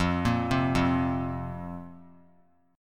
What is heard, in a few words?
F Suspended 4th F; Fsus4 arpeggiated; JGuitar